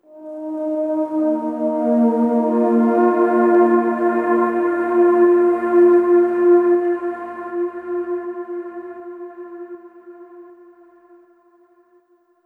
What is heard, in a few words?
Overlay Tone; Act3 Downtown